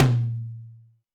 Tom Lo Slam; Alesis D4

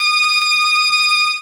organ F, PIPE ORGAN; Retro Sampler

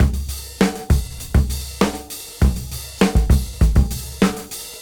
KL Beat 98 BPM

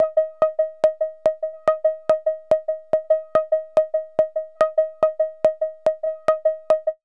VCS PULSE; AMG - Vince Clarke Lucky Bastard

